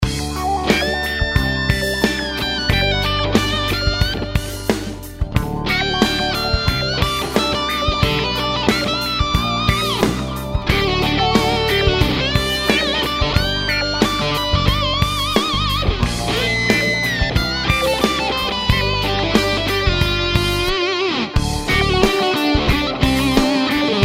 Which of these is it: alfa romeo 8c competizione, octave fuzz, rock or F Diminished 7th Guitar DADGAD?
octave fuzz